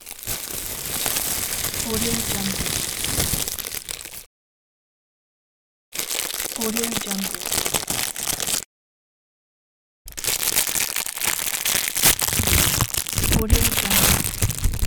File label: packaging